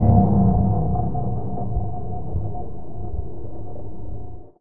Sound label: Engine off